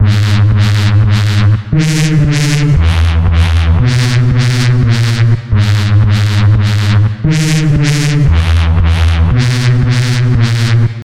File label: Dnb bass